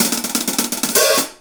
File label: Hi Hat Roll; Essential Percussion